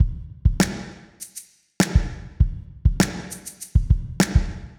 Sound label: Drum Effect Reverb